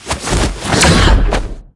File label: Enemy Strigger Attack